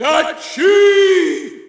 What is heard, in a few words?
Shout, audience; UFC Tapout 2